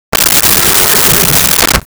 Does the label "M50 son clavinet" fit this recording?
No